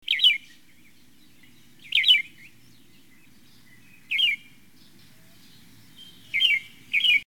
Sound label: Red-whiskered Bulbul; Birds